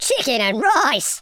laugh, London Cabbie; speech

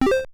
bet Press

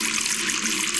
Tap Into Water

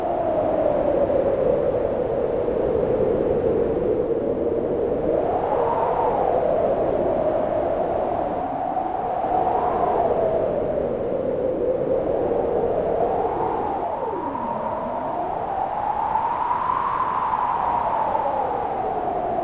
wind loop

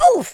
oof, speech, Pirate

